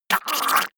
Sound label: monster gurgling high; kreaturen